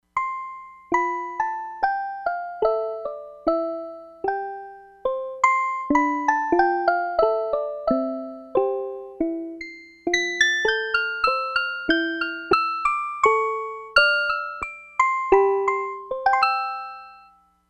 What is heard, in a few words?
CARILLON, Crumar Bit 99; Factory Programs